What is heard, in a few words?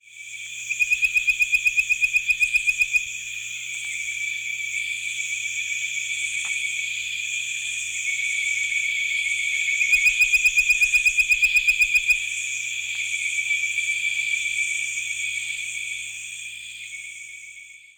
bird voiced treefrog